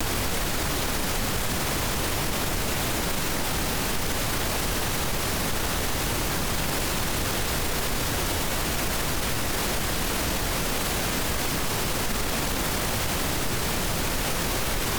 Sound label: crest low